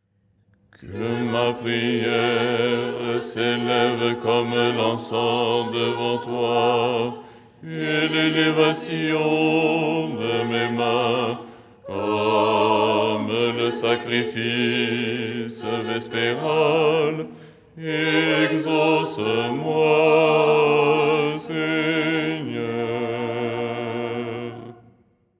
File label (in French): basse